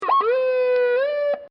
Boat Coast Guard Sirens; Sirens (Sound Effects:Electronics)

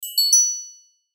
chime arpeggio; Chimes (Sound Effects:Multimedia)

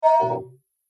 Multimedia Open Sound, beep; Business Programs, Apps And Computing Noises; Andrew Fleming

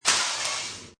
Metal Wire drop; Metallic Sound Sound Effects

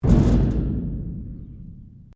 Strike Metal Hollow Tank; Materials

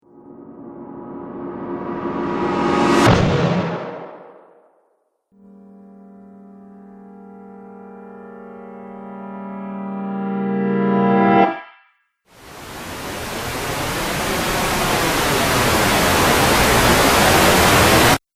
Cinematic Riser Pack; Rise And Dive Sound Effects

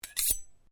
Blade Swish, Sword; Foley sounds for melee weapons; Swords; Combat; SoundEffects-Foundation1

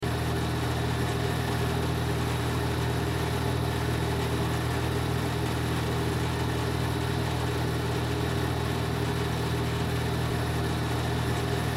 Spot Welder Generator Run Loop; Welders; Machines; Industry; Blastwave

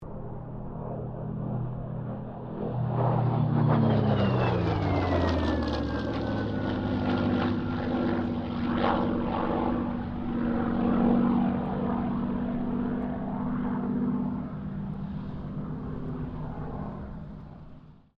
AIRCRAFT SUPERMARINE SPITFIRE MK-XVI PASS

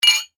household glasses wine x2 clink; Wine Glasses (X2) Clink Together; Clinks; Objects; McKinney Sound

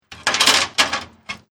Chain Metal Clanging

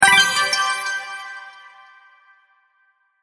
Sparkle Collect Win, video game sound effects

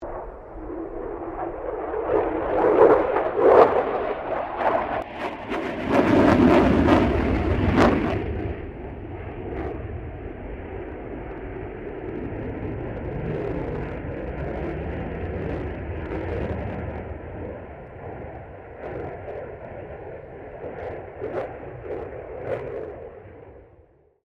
F16 Falcon pass distant, stereo; Airplanes; Vehicles

